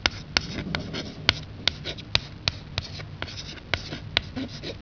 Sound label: CHALK